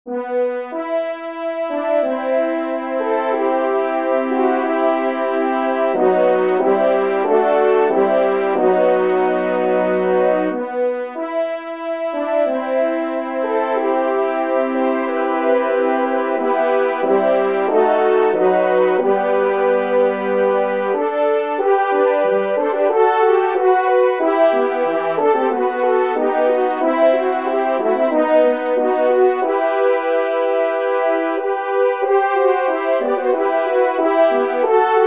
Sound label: Fanfare; Overmasters Fanfare